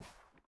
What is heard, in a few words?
Step Carpet; miside demo